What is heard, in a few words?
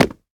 Jump Concrete Land; Devour